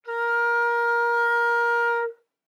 Flute nonvib ff Bb4 stereo; Woodwinds

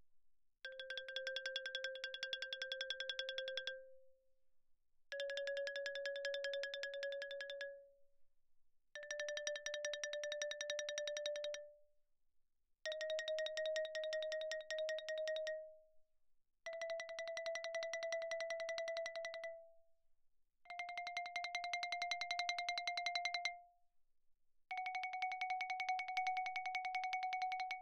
xylophone rosewood roll mf C5 B5; Percussion

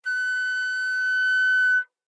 Flute nonvib ff Gb6 stereo; Woodwinds

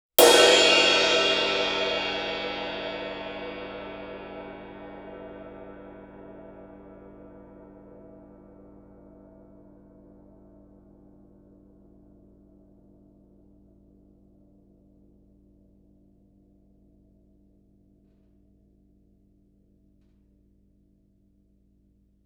ride stick shoulder ff stereo, Suspended Cymbals; Percussion

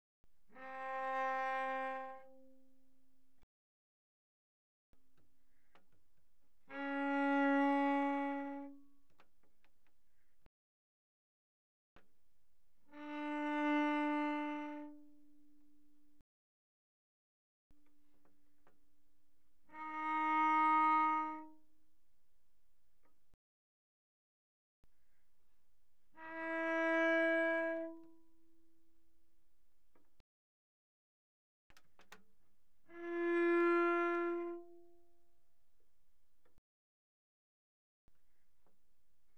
Cello arco pp sul D C4A4; Strings

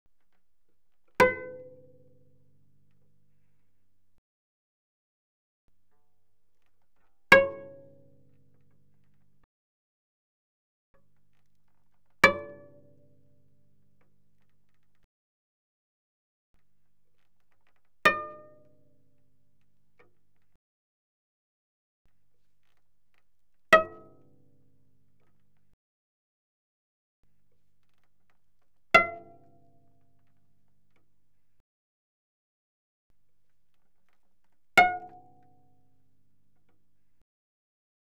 Cello pizz ff sul A C5A5; Strings

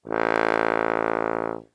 Tuba ff A1; Brass

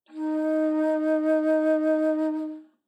Bass Flute ff Eb4; Woodwinds

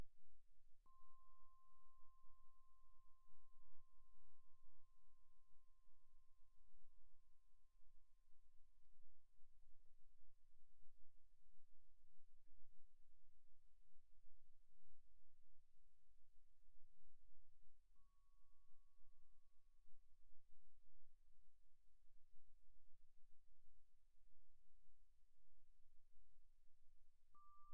Vibraphone sustain pp C6 F6; Percussion

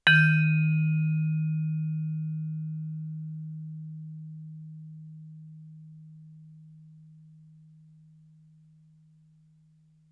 Vibraphone sustain ff Eb3 stereo; MIS Pitches - 2014; Percussion

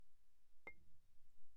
Marimba deadstroke pp C7; Percussion

